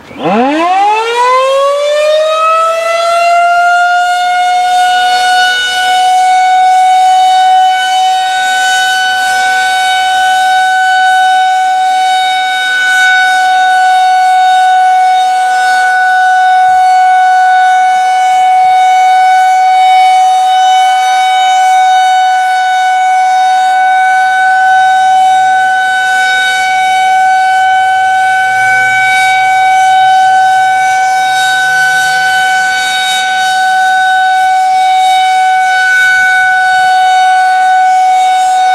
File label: Tornado Siren